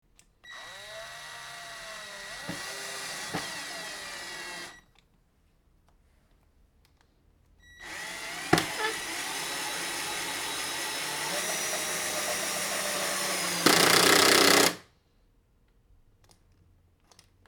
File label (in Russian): shurupovert, zvuk shurupoverta